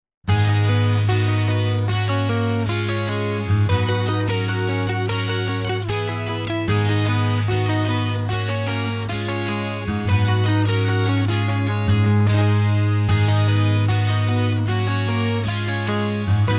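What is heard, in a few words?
Acoustic Guitars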